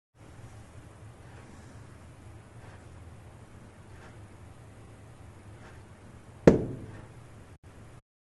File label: Waffle falling over